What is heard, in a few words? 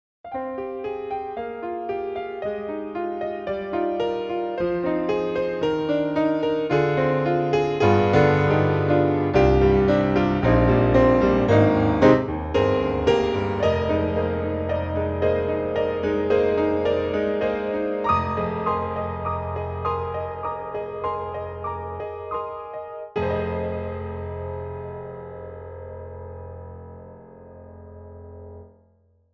STNWY PIANO PEDAL